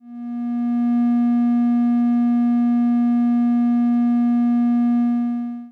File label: Triangle wave